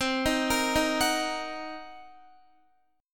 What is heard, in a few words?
C Minor 7th Flat 5th Guitar, chord; Cm7b5; arpeggiated